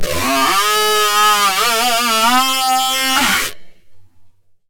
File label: Banshee Wails, FX Atmospheres; Zero-G; Soundclash Nu Dance Electro; Acidized Wavs